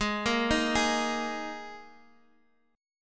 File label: Ab Suspended 2nd, Guitar, Open G; down